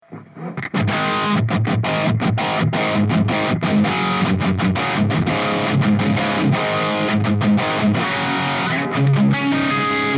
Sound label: plexi tone